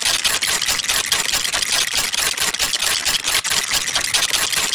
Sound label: chomp; LoL; jinx base